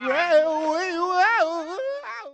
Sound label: wooo; The Interactive Alphabet